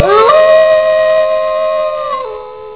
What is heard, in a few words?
howl, wolf